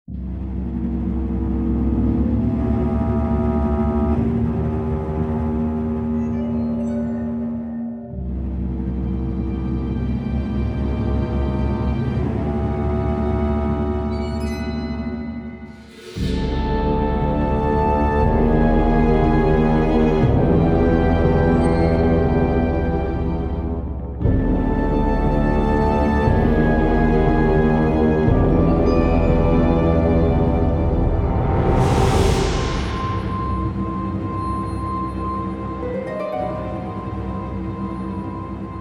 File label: Suspense; pippa funnell the golden stirrup challenge windows gamerip 2006